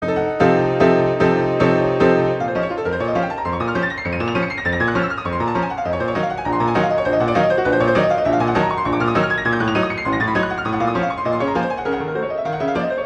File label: in B flat minor, Maestoso-Presto; Chopin; Preludes